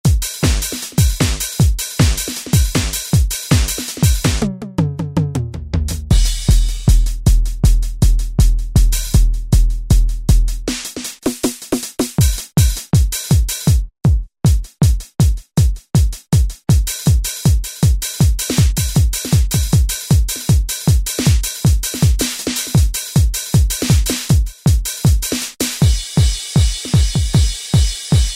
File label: Dr Tech Kit, Yamaha EX5R; Factory presets